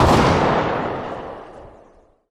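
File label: mag7 distant, gun; arccw go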